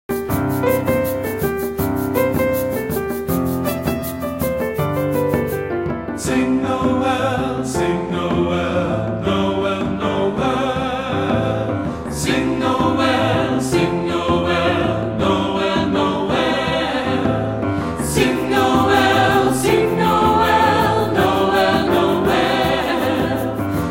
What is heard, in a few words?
african noel